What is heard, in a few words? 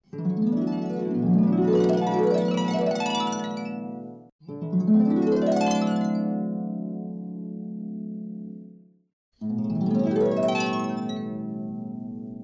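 harp 7th arpeggio, chamberlin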